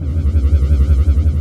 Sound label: Scifi Mechanical Arm Loop; SFX Kit; Sci-Fi Loops